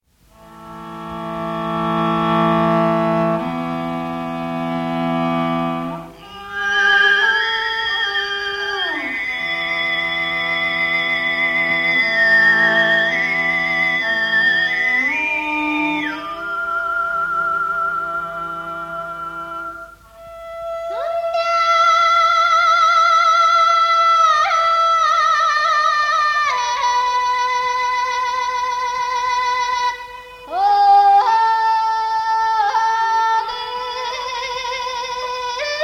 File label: Mongolian Throat Singing